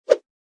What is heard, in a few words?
bullet create; croods